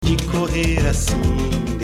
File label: 5 notes Motif